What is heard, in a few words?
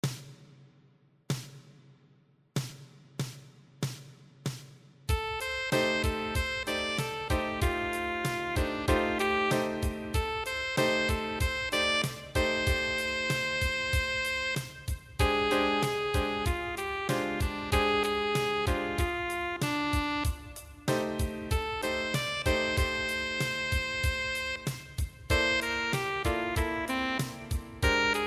PLAYALONG BASS